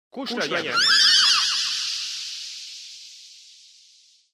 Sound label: strange